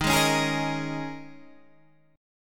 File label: G sharp Suspended 2nd Suspended 4th, guitar